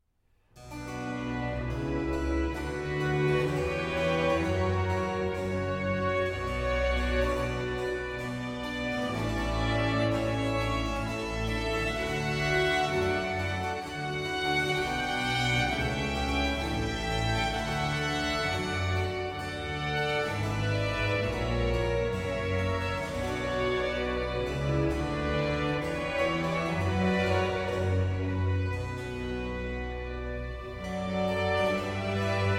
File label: Trumpet Concerto in D Major, Adagio - Presto - Allegro; Torelli